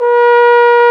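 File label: brass, Trombone; Roland L-CDX-03 Disk 2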